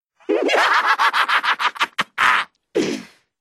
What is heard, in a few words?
Demon Laugh; Cicero